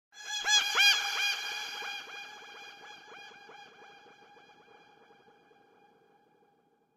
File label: Gull Eerie Croak - Quick Pass By; Hollywood Edge; sound designer's toolkit v2